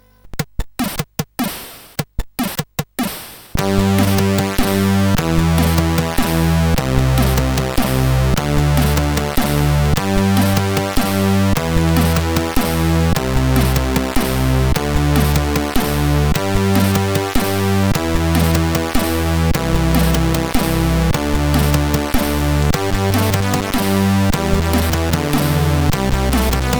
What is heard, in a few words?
High Score, sid CSG8580